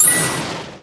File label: combo; match3